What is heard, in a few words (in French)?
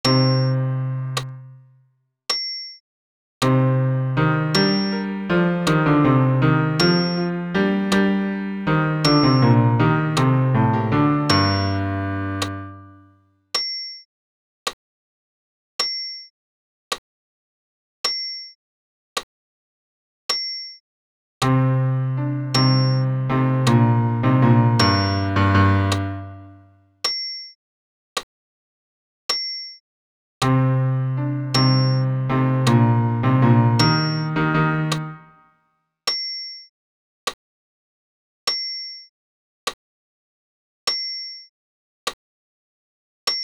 Credo bas pian acc